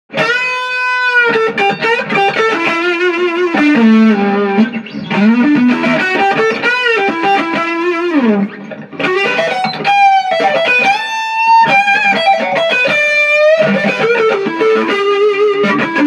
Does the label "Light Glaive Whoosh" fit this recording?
No